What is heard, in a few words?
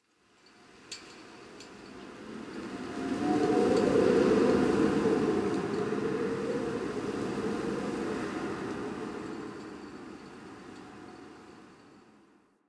Exterior Winter Wind One Off; Warframe; Ambience; NineteenNinetyNine